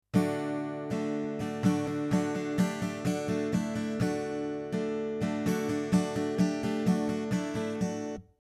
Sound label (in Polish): whisky D dur